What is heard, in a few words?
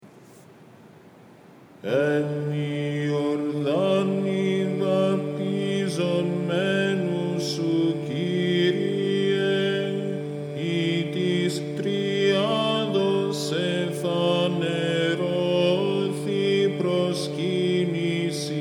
Theophany Greek, hymns